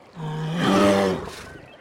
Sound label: Animal Call Bear